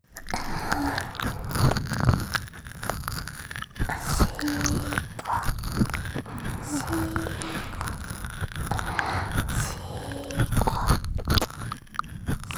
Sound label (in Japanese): 耳舐めシコシコループ; 発電用